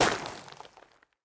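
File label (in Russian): тренировка удара по кирпичной стене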